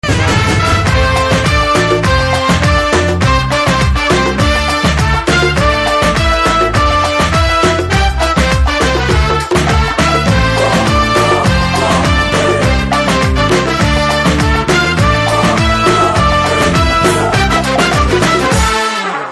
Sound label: Instrumental Tone